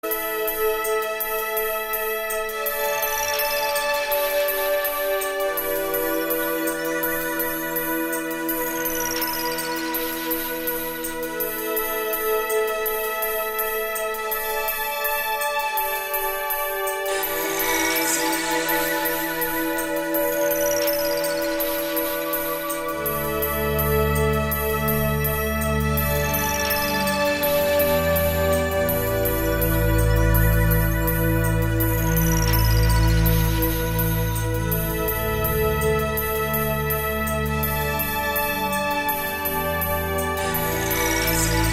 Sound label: 24-7 drum and bass